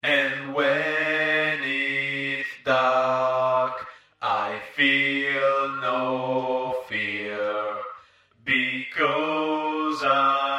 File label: vocals